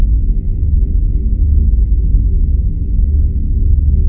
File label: inside hum, dam; soundscapes